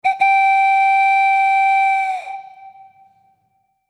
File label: Cartoon, transition